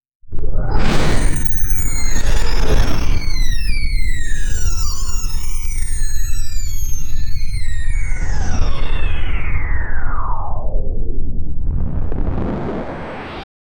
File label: intermittent powerful, space